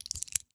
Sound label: raging bull jiggle